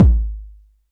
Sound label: BD